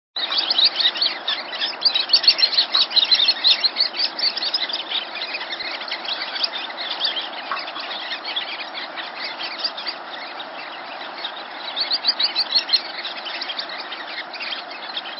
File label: Sanderling, Birds